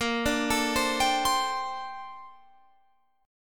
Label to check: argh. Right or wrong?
Wrong